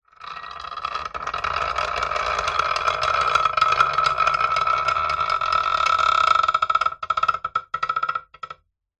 industrial lever metal large heavy slow movement creak; zapsplat